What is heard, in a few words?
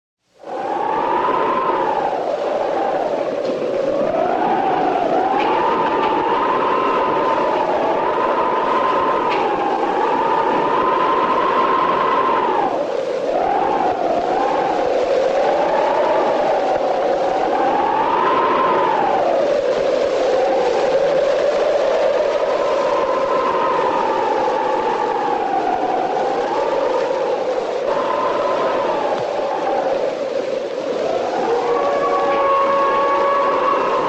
Thunder, Lightening and Rain; Halloween; Haunted House